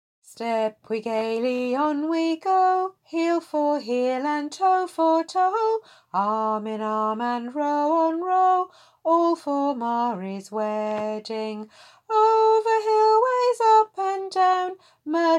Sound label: Whole choir, irish folk; sea shanties; Mairis Wedding